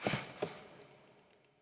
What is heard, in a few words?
Double Breath